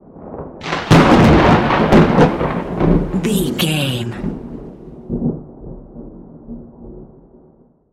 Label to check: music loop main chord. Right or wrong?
Wrong